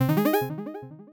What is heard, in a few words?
ready; tappytux; lazarus-ccr